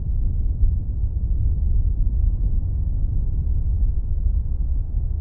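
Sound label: ambient lowtone, sewers; garrysmod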